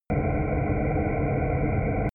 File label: drone spaceship shrill